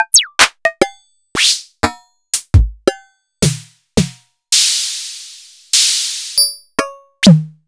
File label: Sony DPS-F7 F7 Kit